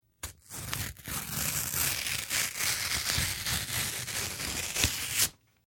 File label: Paper Rip Foley Tear; Materials